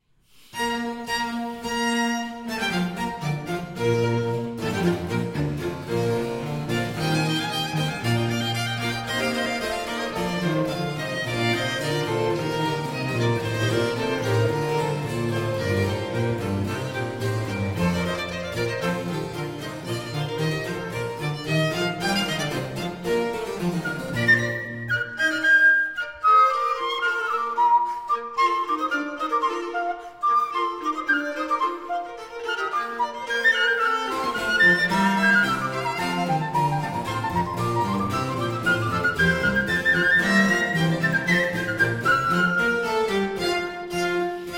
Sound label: Concerto For Piccolo, 2 Violins and Basso Continuo in C Major, Allegro